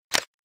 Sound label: cam snap; LG; X Cam K580